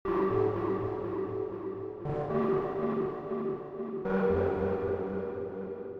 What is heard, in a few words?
error